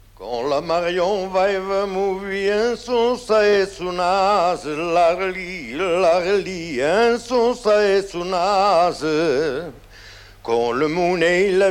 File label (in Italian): canto; france